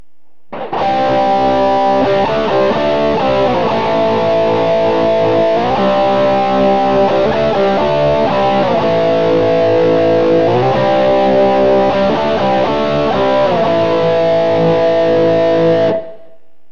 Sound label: bridge crunch; Burny